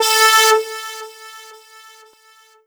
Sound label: Alien Horn